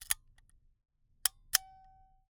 Camera, Argus, aluminum, steel, professional, fully mechanical, advance film, snapshot; Eiravaein Works; Vaeyan IV